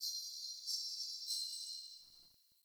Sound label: Under City Alley Distant Chains